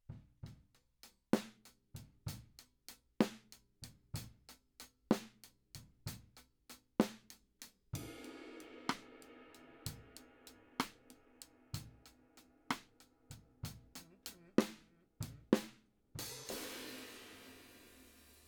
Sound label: Drums Para